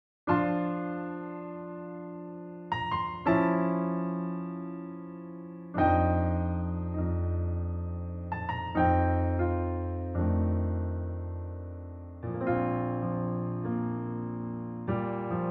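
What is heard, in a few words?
Bb Piano, male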